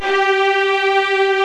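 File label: arcato string; OMI - Universe of Sounds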